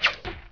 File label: shoot Well